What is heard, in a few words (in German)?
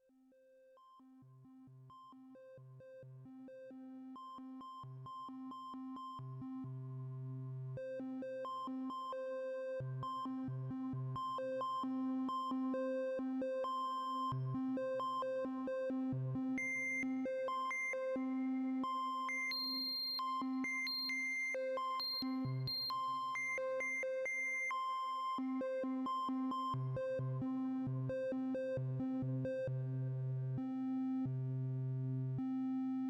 DOEPFER A 149 4 Oktaven